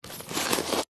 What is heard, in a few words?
household book telephone directory pick up; zapsplat